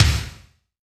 BD, BASS DRUMS; EdgeSounds

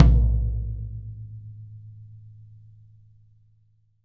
YAM18, drums

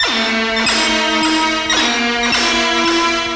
Vibes; Urban Shakedown

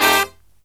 low chord, brass riffs

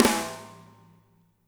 MED SNR, natural kit; Spectrasonics; Burning Grooves CD2

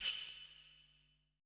SHELLS, PERC; BPC PERC

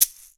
SHAKER, Percussion

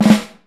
Triple Roll; Proteus 2000; Digital Sound Factory Proteus 2000

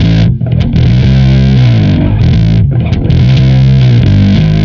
Bass, Distorted; Bass X

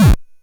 Drums, Hits; DCM8

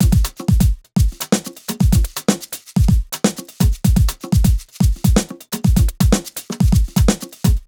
broken, 125 Beats

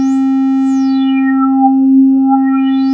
VCF SWEEP

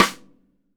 Snare, rim shot

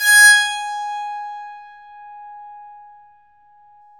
BOWED PSA, Bowed Psaltry

